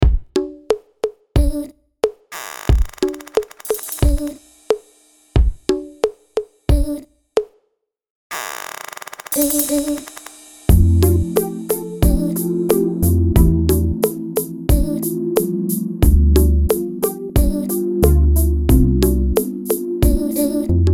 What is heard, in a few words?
instrumental; weores sandor